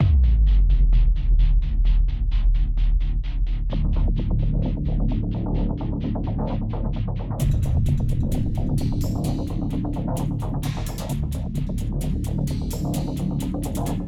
Menu Lobby Option Black Market BGM Loop; crossfire online

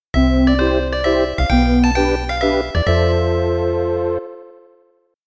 Unknow Jingle; Fighter's History; snes gamerip

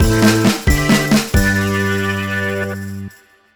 Win; Hamsterdam; android ios macos mobile switch windows gamerip 2019

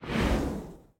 Menu Portal Deselect; A Dance of Fire and Ice

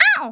HIT GOOSE

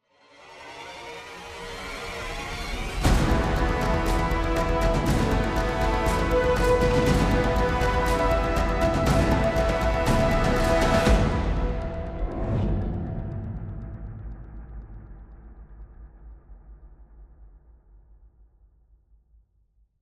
Character Select, Solo Mode; apex legends season 12; Gibraltar

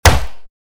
bullet impact wood heavy; Out of Reach: Treasure Royale

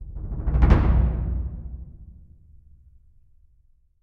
sound design impact timpani; song of iron

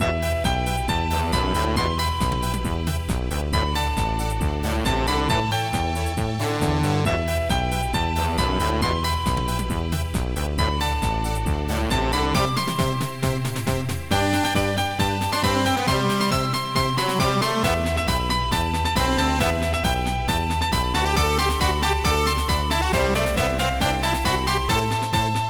Walk in Forest; brave dwarves 2

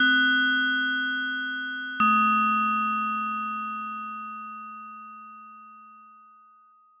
Bells Scare

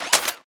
Gun mech Reload

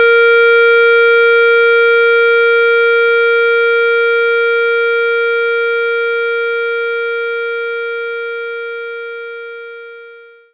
clarinet Bb4

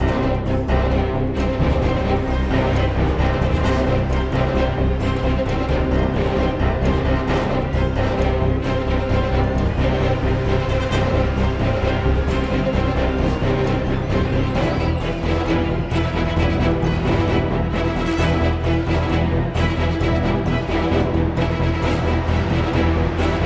End Battle; There Is No Game: Wrong Dimension

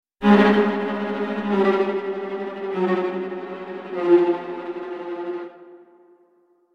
Cartoon Evil String Tremolos

